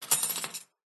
glitched tones household dining room tile table cutlery pick up clatter